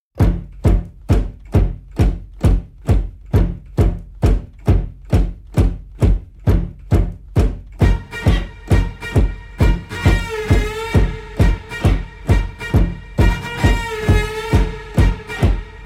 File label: Electric Pow Wow Drum